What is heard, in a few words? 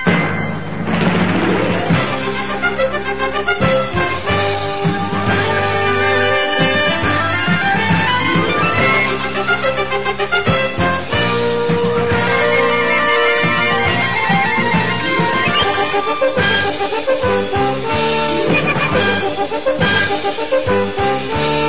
fanfare